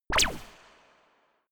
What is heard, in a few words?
space laser gun double shot; krieg-waffen; soundpool